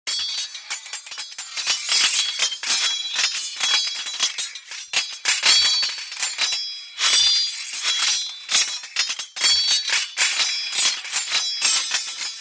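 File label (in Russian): zvuk mechej vo vremja bitvy, swords during battle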